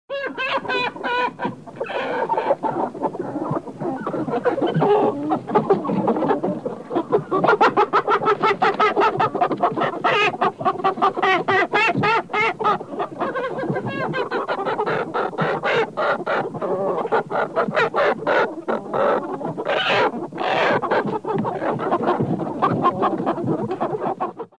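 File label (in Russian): звуки курей в сарае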